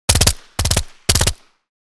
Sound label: Thompson submachine gun two three round bursts; war